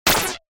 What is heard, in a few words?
Glitchy Punch; soundscrate